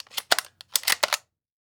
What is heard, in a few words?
Weapon Gun Machine Gun 9mm Bolt Lock; AdobeCC